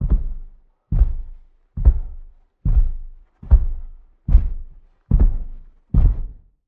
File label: Santa Delivery Rooftop Steps